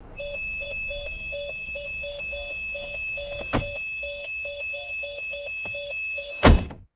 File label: steady electronic car door chime; vehicles